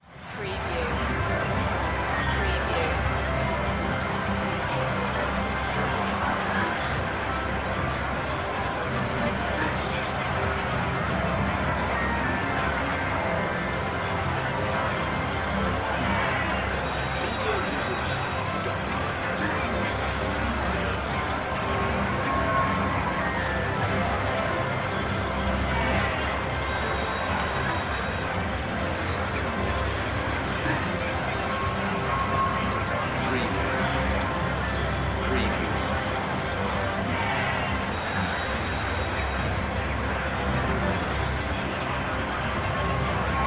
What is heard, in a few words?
Ambience Casino